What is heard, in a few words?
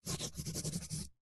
journal, ui